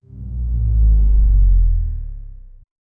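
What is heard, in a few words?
dark trap cinematic downsweep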